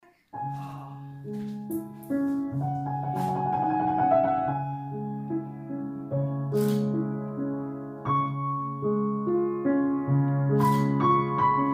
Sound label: piano